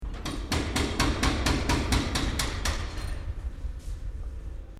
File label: Construction Mobile Jackhammer Operation Short; Various construction machinery